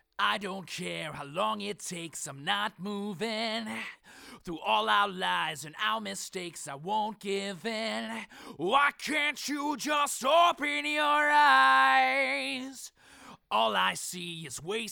Solo Telefunken U47, virtual mic

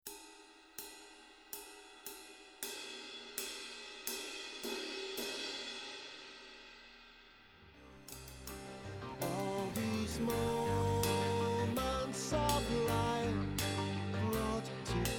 ride cymbal

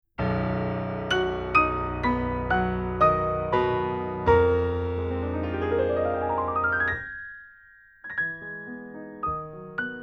Telefunken TF47, record a piano; how to record a piano

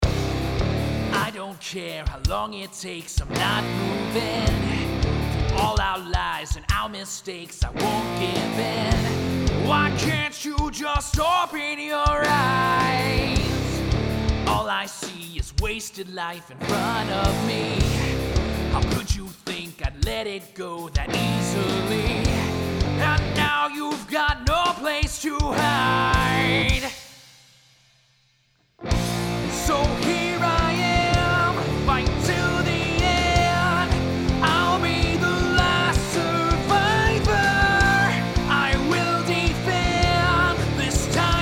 Track Slate SM7

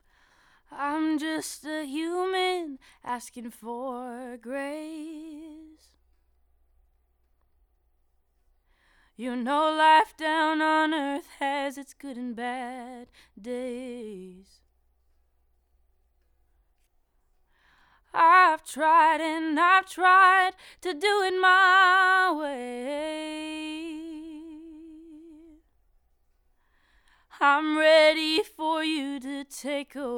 F Solo; Telefunken U47

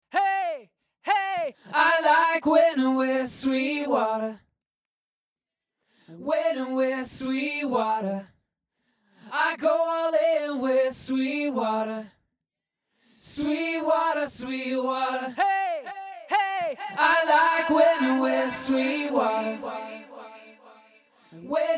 BGVs Dry Wet; Bob Clearmountain; Apogee plug-in

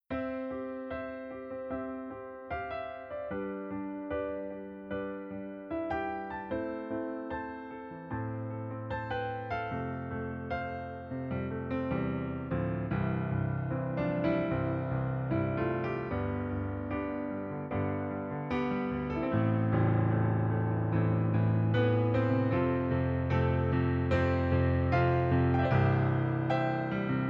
CP4, digital piano; CFX; best digital pianos shootout

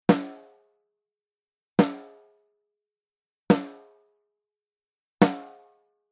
Focusrite828 low z, snare drum; preamps

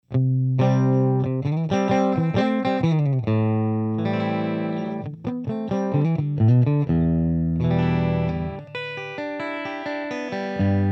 414xlii clean, guitar amp; guitar amp mics

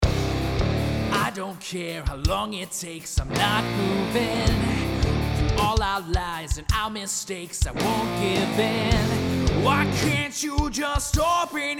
Track Townsend 251, virtual mic

